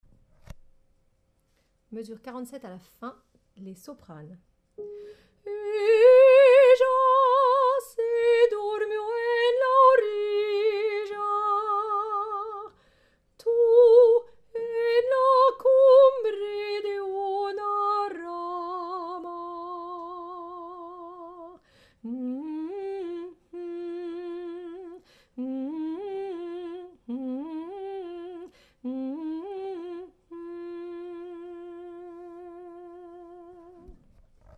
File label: paloma Soprano